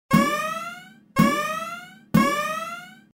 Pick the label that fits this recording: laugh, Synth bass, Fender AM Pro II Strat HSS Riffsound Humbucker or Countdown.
Countdown